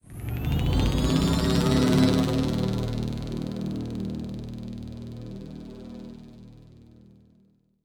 synth texture; Electrostatic Field

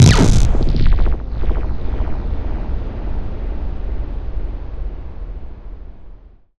modern cinematic impact; BluezoneCorp

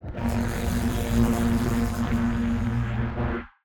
Electric Arc Reverberant Shock Long; Sound Spark LLC; Electric Arcs and Energy

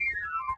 Hovers, Game & UI Interface; L.A. Sounds

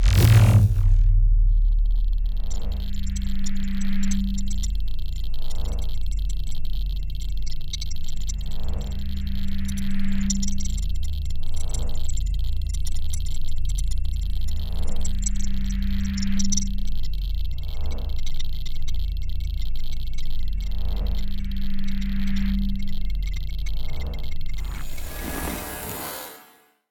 Utilities Sensor, future weapons; SoundMorph

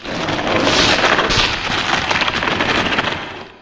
hydra send tentacle; hl2

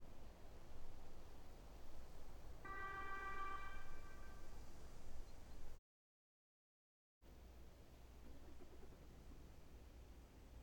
Volvo V70 Ambulance - Siren Various; Volvo V70 2013; ORTF; MKH8040

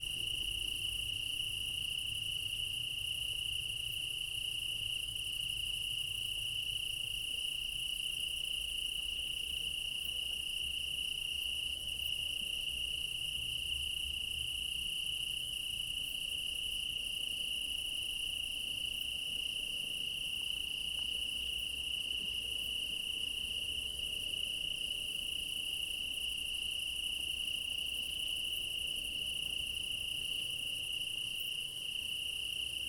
meadow night ambience, crickets, Crimea in summer; ortf offset by 3 m, front - ORTF; Quad ambience of Crimea in summer; Alexander Kopeikin

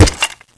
foot, cremator; hl2

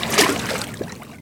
water splash; impact; physics; hl2

